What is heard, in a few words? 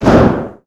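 Collision Interior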